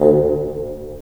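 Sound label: wind bassoon; LA Composer vol. 3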